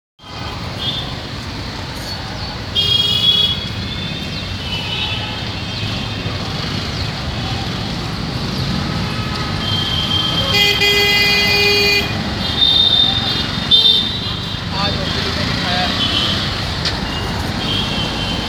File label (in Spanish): Pitidos; DELHI